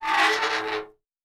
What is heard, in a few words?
Metal Foley Creak; Sci-Fi Sounds